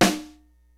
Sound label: LIVE AC KIT, DRUMS; Kid Nepro; Elite